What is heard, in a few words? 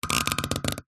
rubber tape stretched across metal squeak rub; zapsplat